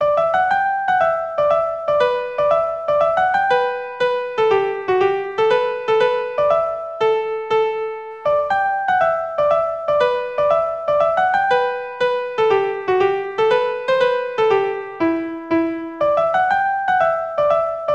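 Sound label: Gypsys Hornpipe, Traditional Irish Music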